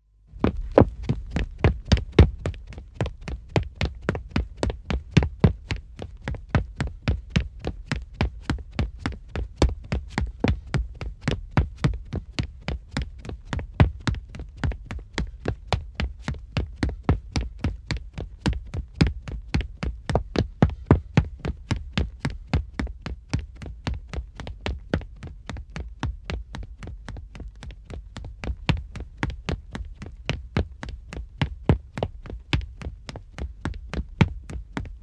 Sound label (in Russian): zvuk bega po derevyannoi poverhnosti